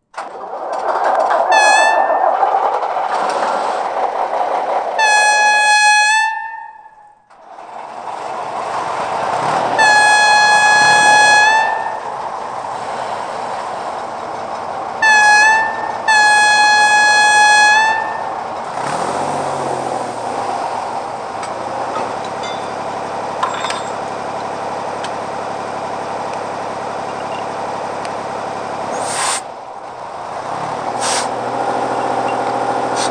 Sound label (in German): Hoerprobe Klv53